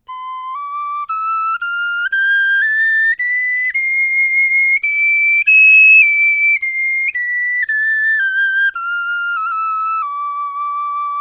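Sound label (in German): tonleiter, Knochenflöten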